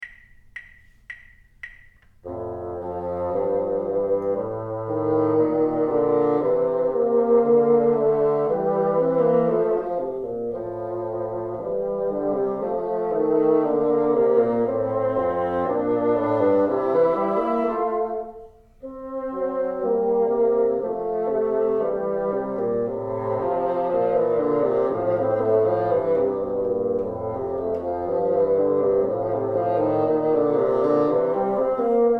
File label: together, bassoon